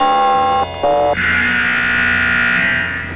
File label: claxton